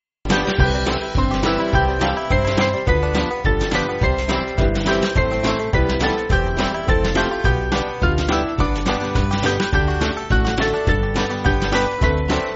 Band, Backing Files for Churches; With God As Our Friend; Ahnfelt; 4/C-Db

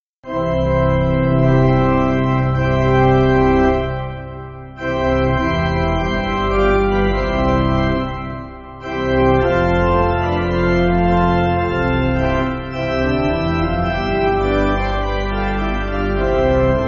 Pipe Organ, Free Music Backing Files for Churches; Hail Hail Jehovah; J.H. Rolle 11.10.9.9

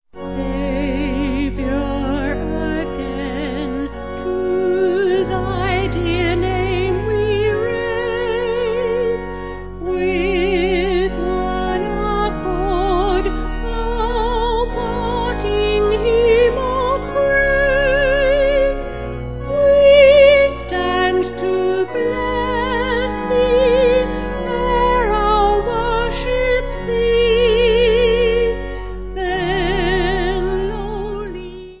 Vocal Organ

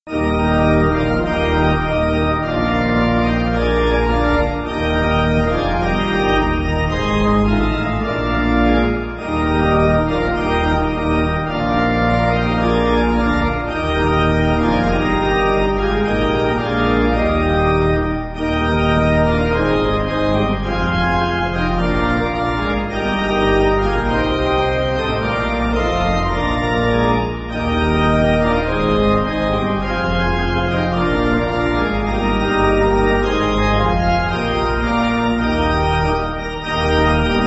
Pipe Organ, Music Backing Files for Churches; Praise Glory Power Honour; Union Harmonist

